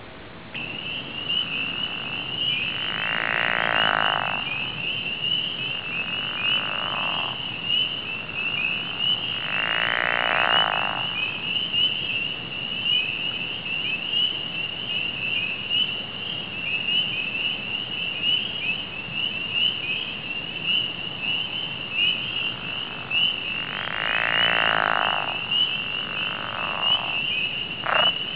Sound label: pickerel, frog